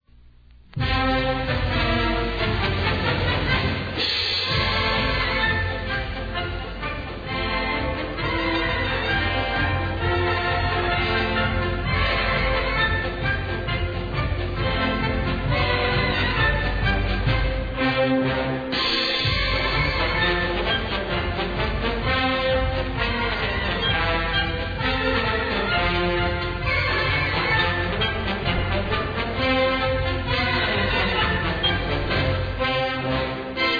Thunderers March

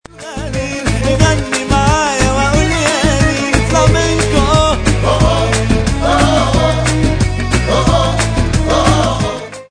flamenco

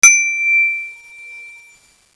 chime

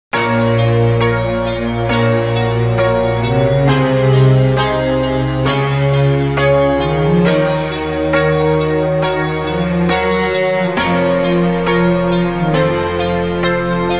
slow

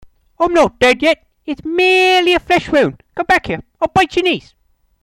pain

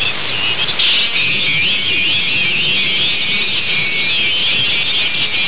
pileup

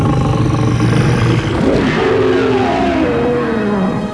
growl

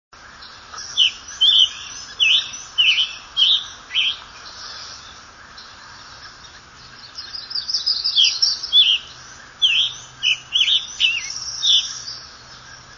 tanager song, scarlet tanager; birdsongs

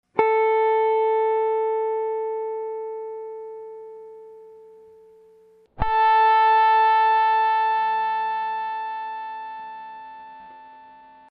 guitar note plus lp fwr

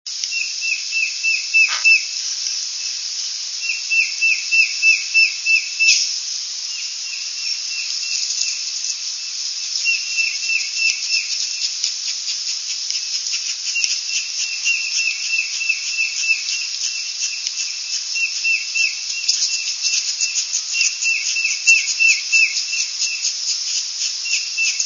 timouse duet peter peter, tufted titmouse; birdsongs

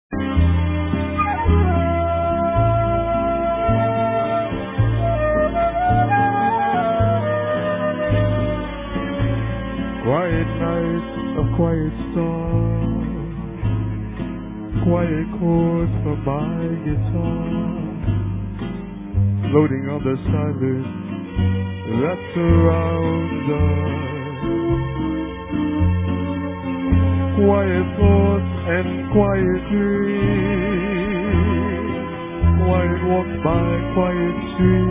quiet night

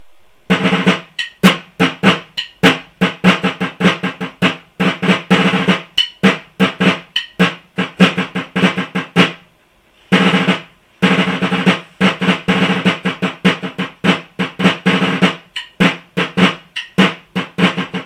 quickstep

